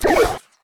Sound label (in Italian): Artiglieria; BLIND QUEST - The Enchanted Castle